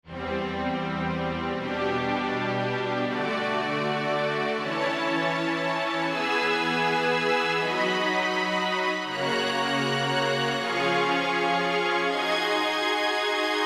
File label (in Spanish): Armonías